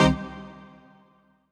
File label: HOUSE, House Dance